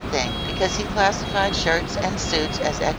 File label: noisy